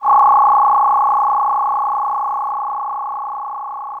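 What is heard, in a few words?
DX100 C#5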